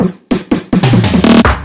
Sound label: 145 BPM drum fill; Singomakers; Infected Psytrance